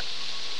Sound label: mew